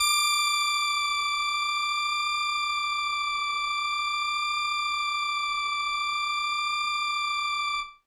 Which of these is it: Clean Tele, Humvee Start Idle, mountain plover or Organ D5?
Organ D5